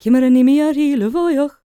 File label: GAELIC, World Voices, GAELIC FEM